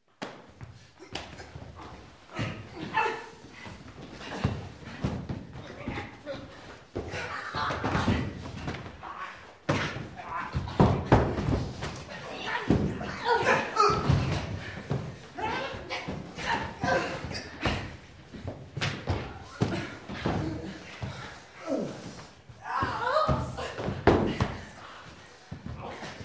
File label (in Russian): zvuki draki liudei i udara kulakom